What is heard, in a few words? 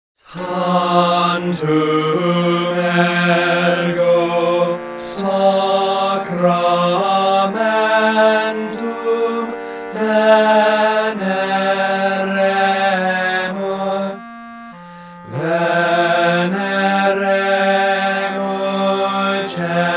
mid with INSTRUMENT